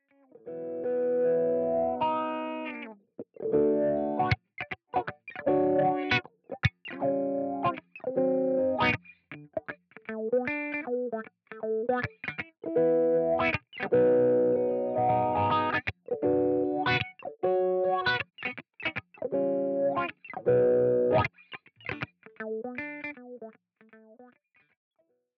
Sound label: Wah Gtr